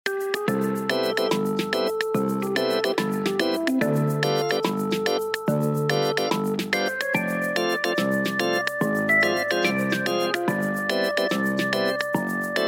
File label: the seaside iPhone ringtone